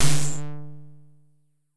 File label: side trap; dos lem